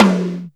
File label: TOM HIGH